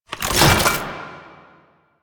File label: Key Drop; Assassinate